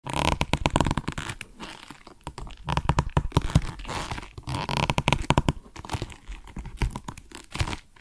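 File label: Leather shoes, squeak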